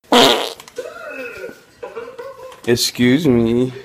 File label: fart; soulja boy